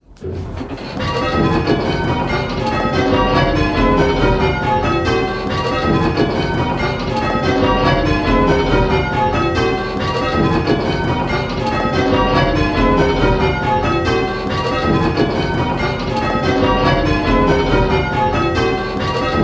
Striking 10-Bell Call Changes, Tittums; Pebworth

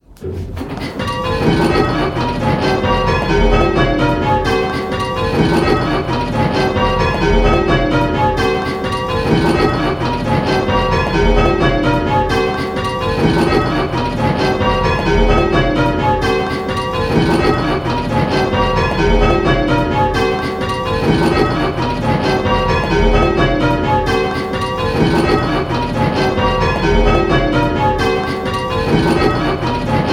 Penta, bells; Pentatonic

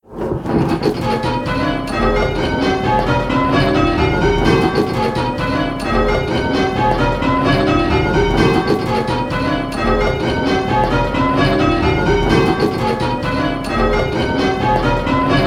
Striking 8-Bell Call Changes, bells

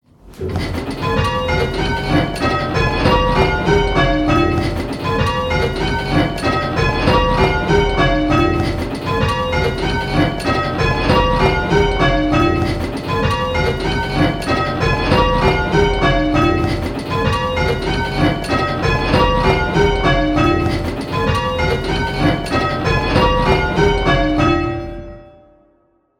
Striking 6-Bell Call Changes; 214365; Pebworth

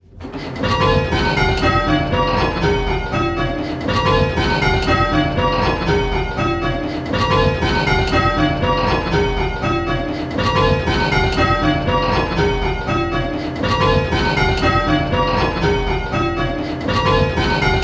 Striking 6-Bell Rounds; Pebworth

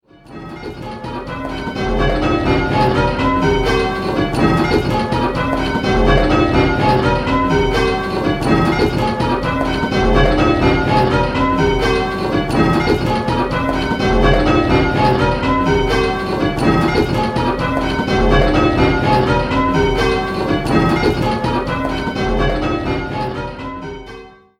8-Bell, bells; What place is the treble in?

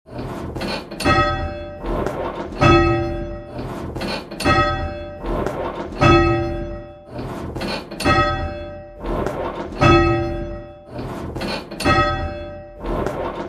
bells, 8 Bell Games; Just One Bell; Pebworth

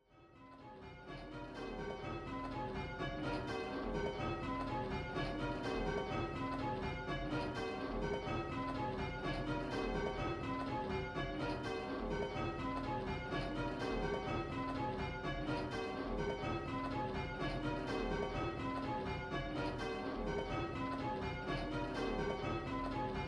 call change, 8-Bell

